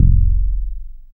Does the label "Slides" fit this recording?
No